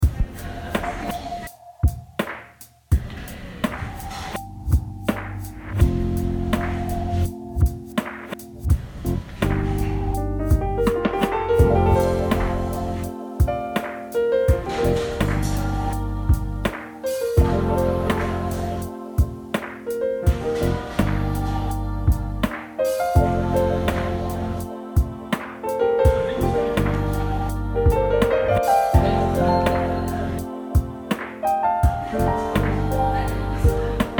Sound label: Lofi Jazz